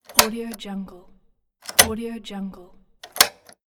apartment door lock; lokohighman

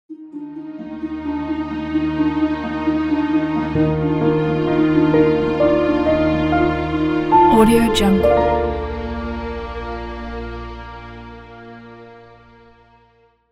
cinematic logo

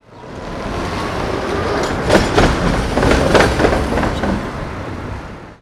tram passing

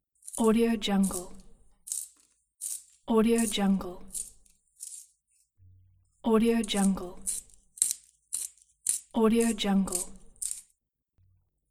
sharpen a pencil

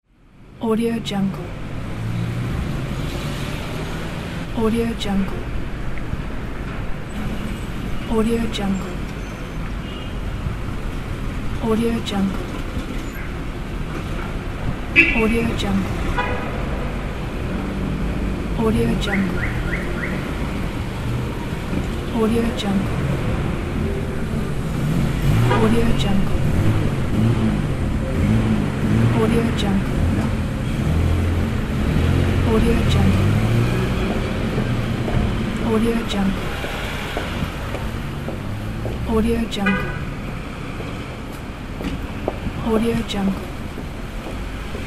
street ambience

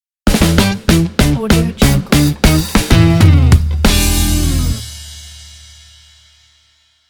short funk logo

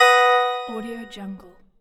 game success chime

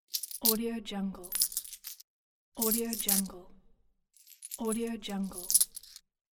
coins sounds

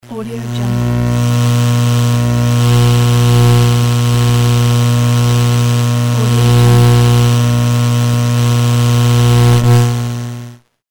interference

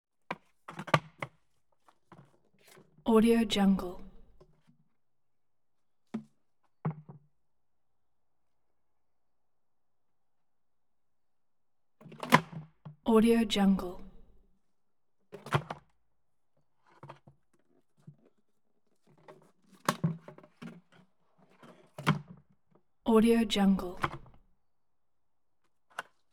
stacking traffic cones; collanderson

